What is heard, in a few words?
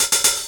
HIHAT TRIPLE; DRUMS; HI-HATS